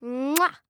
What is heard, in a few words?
kiss